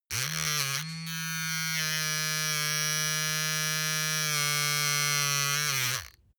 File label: razor clatter on load; maschinen; rasierer